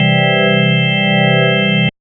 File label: Church Organ Chord; Big Fish Audio; OSH Chords